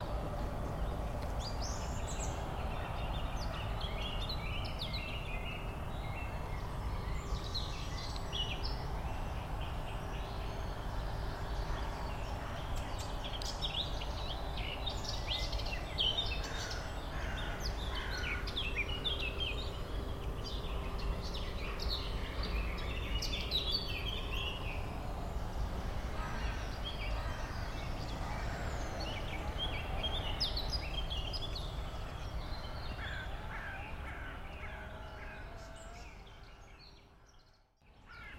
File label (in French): ambiance